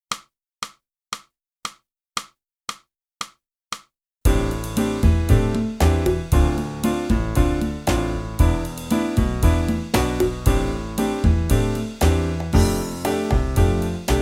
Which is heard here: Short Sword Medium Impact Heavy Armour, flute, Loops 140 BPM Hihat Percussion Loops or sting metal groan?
flute